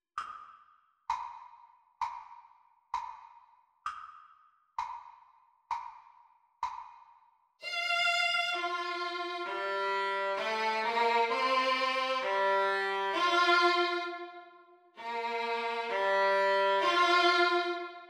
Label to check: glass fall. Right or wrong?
Wrong